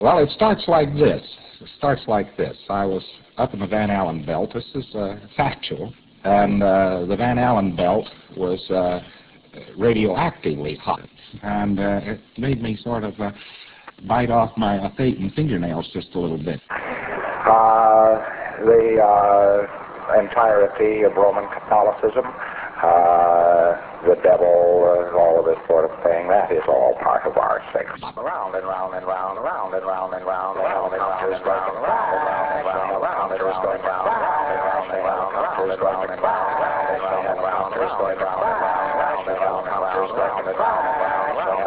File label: helicrsh